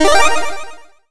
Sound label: Effect Equip; Yume Nikki